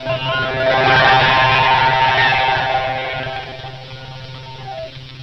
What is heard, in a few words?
fx (shocker); Sonic Foundry (Sony Creative Software); Ugly Remnants VOL-2